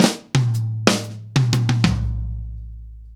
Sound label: Ballad Fill, Drum, 70's Ballad; Sonic Foundry (Sony Creative Software); Drum Tools; Laidback